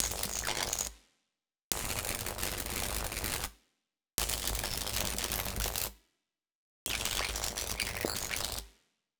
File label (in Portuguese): efeito eletrico